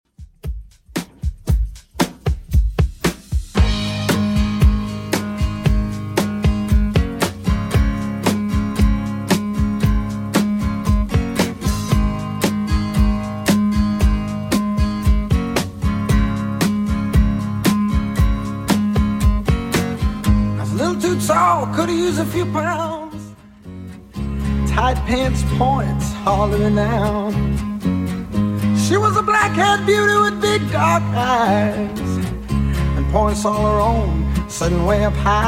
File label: 70s Redrum 115bpm